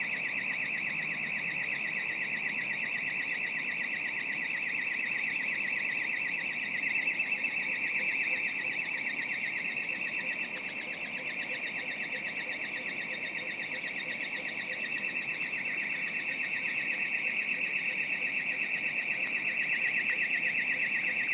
morning chorus burglar alarm

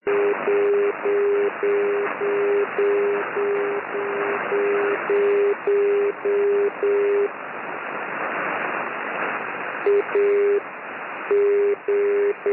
6m beacon

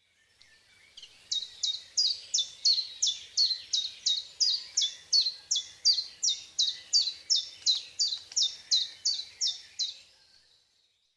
chiffchaff

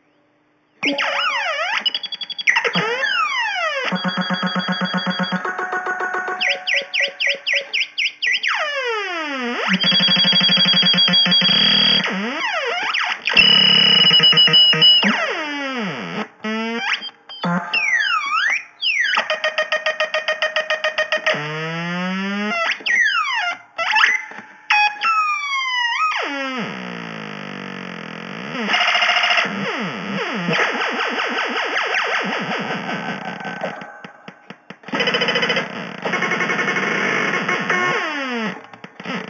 ema synthi vcs3

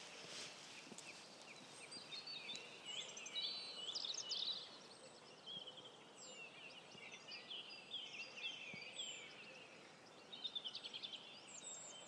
April morning chorus, house and gardens; killruddery

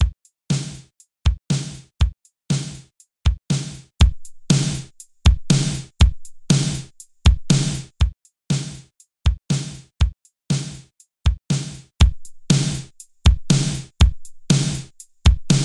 twin tubes on drums; slate vmr spl

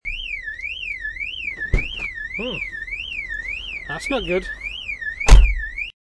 alarm; sunny lancaster

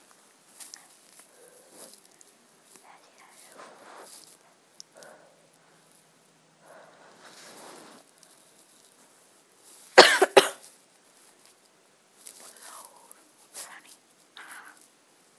lol funny stupid

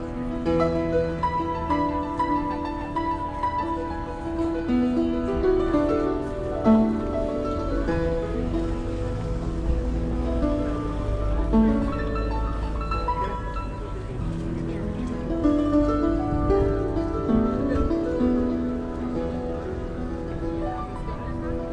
seattle market piano man

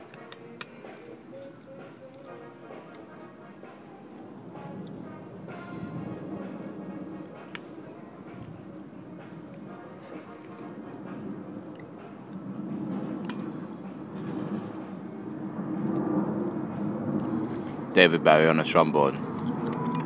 trombone

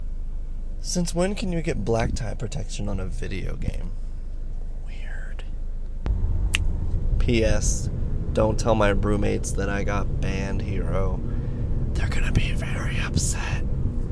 uhhhh ohhh

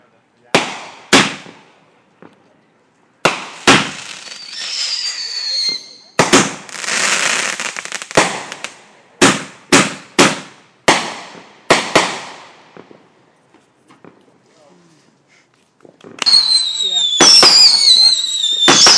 backyard fireworks

